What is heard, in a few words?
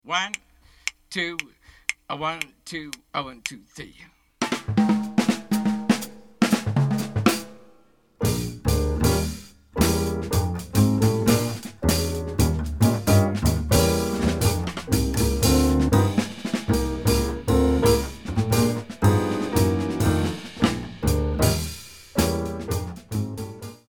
med up minus Melody